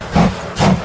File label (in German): dampflock; helpTheTrain